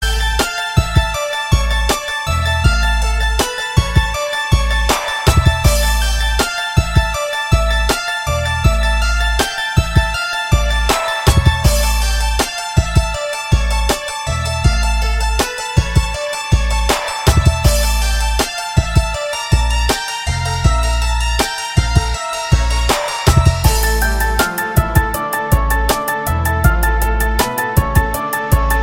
Mysterious Tone

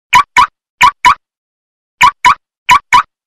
Car Lock

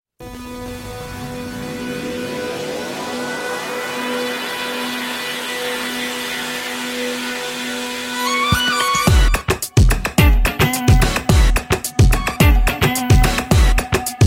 Bollywood Tune

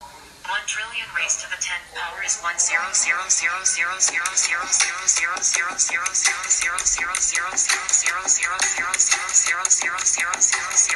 Beatbox; Siri

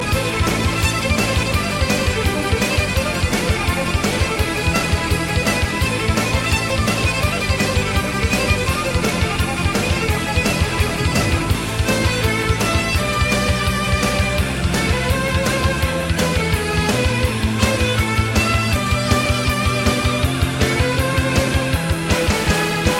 Energetic Violin

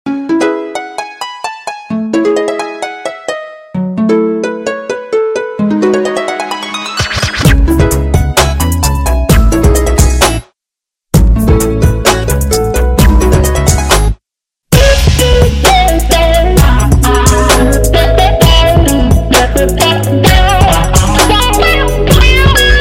Nice Ring Tone

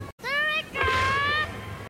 calling; Trico

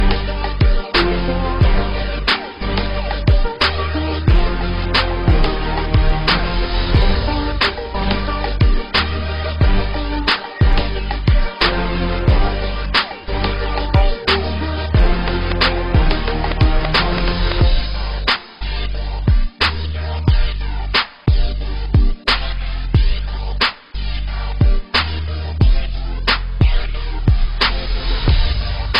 Hip Hop

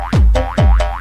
Boing

